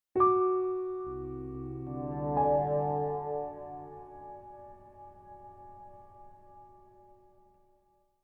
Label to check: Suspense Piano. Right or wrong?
Right